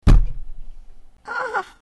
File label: thunk